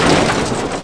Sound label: crash; savagewheels